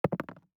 DICE on Neoprene, Throw and Roll, Standard, 1 One Die; Samples; ArticulatedSounds; DICE Sfx Party (Extended)